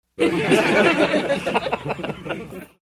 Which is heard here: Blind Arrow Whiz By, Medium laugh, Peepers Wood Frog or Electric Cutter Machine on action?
Medium laugh